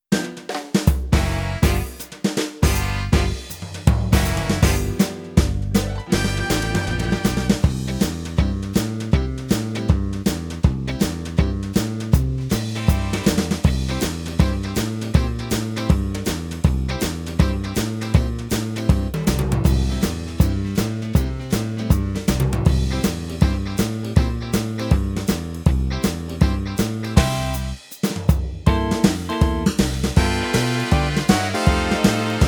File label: Boogie; MIDJAY